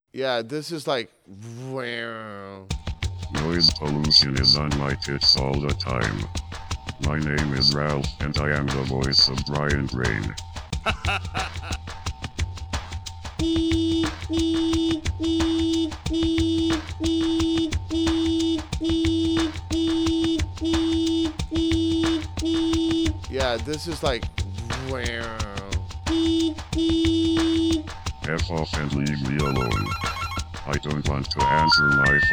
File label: noise